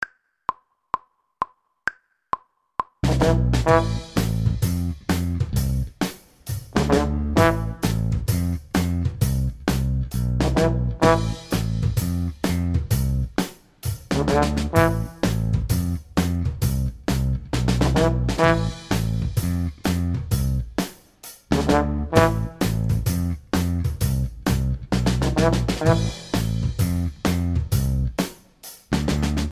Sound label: baritone section